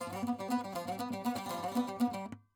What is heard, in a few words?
saz